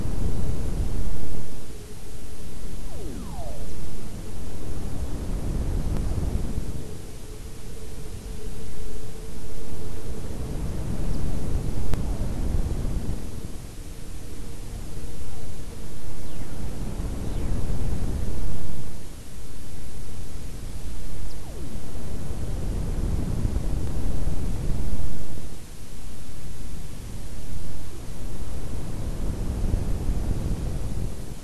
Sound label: WFR audio emfisis